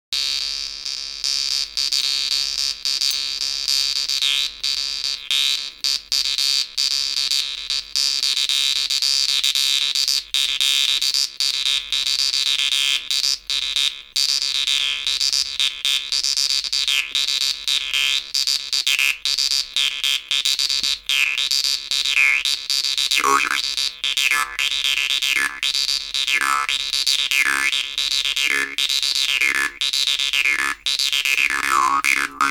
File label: improvisation, jews harp; Batman